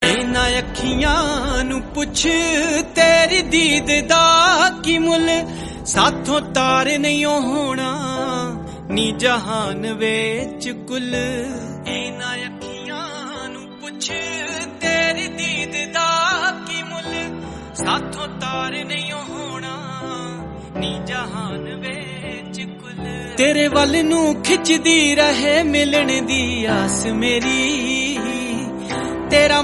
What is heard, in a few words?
lofi remix punjabi song